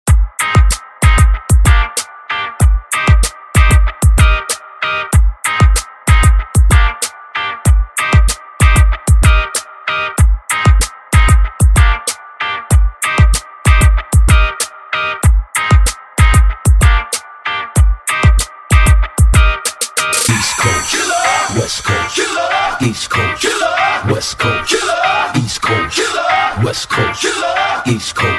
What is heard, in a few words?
Clean Hype Drop